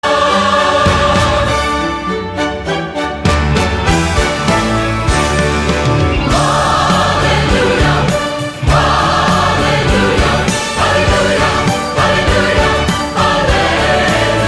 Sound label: Hallelujah Chorus; Joy of Christmas